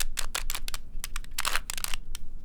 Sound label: Small Cube Twist, Rubik's Cube